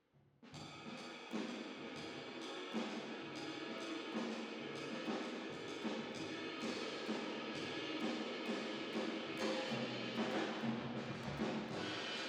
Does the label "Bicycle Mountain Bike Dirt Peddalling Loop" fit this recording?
No